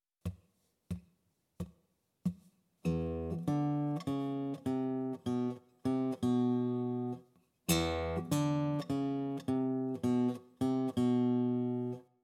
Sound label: Open Tunings For Blues Guitar; An Easy Guide To Open Tunings For Blues Guitar